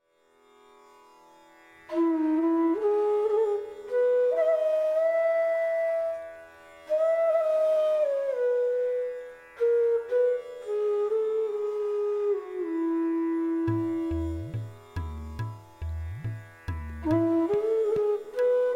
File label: Raga Bihag Flute; Bansuri Student Zone